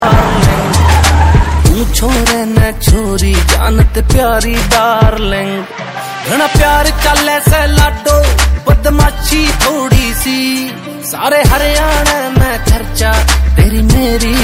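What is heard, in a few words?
Haryanvi song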